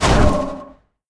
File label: air disc; smite; kukulkan